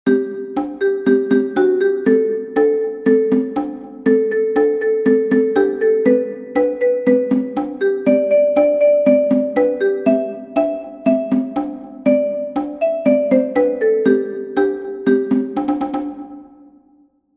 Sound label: Cajon; Drei Chinesen